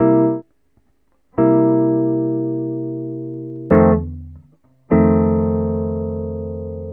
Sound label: guitar, Loops